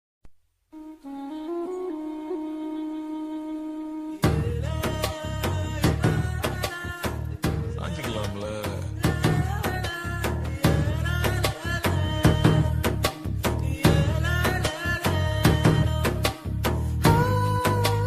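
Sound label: love background music ringtone; paruthi veeran; magical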